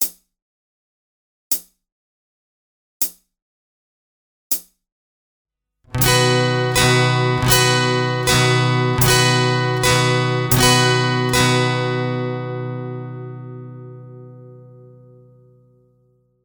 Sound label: Eighth Notes, capo 4, SLOW; Ultimate guide to strumming book